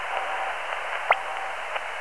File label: chirp